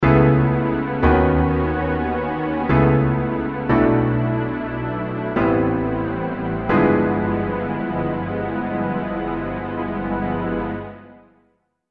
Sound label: Str Piano Pad